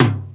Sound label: RX5 TOM; Hollywood Edge; The Big Bad Beatmachine